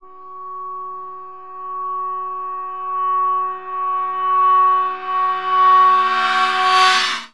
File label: perc f# rev, Percussion; Chillout (ambient1&2)